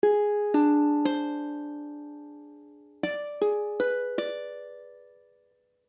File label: Abdim, akordy ukulele